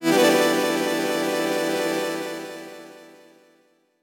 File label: Synth With Filter LFO